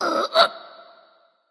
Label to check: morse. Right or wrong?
Wrong